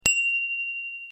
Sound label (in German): Fahrradklingel Panda mit Hut